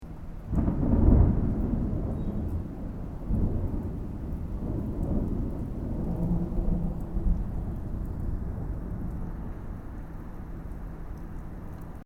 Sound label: THUNDER DISTANT STEREO; Elemental weather effects; Thunder Sound Effects